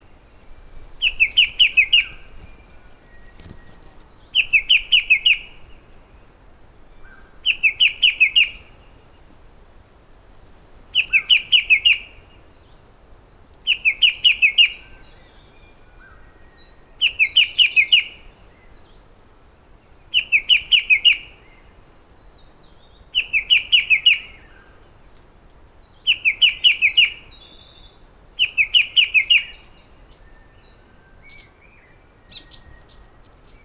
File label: shrike babbler blyth Pteruthius aeralatus